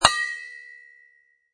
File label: Impact Metal; Thin metal impact with ring. Small metal object such as can or lamp shade hit.